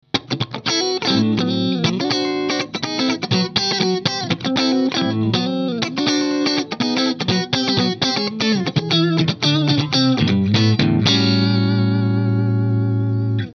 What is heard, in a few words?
ZIPPER HARD